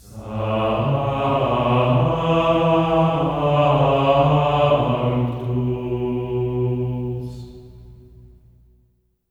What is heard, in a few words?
SANCTU A3; Spectrasonics; Symphony of Voices; GR SANCTU